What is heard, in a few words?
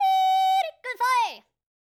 YODEL, World Voices; ILIO; Vocal Planet